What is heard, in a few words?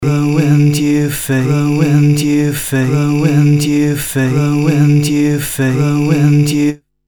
Cross Fade Loop